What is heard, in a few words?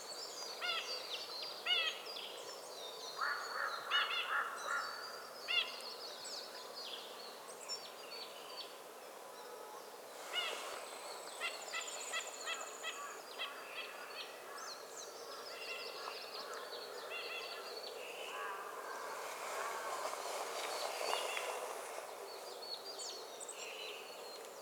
White cheeked Nuthatch Sitta leucopsis, white cheeked nuthatch call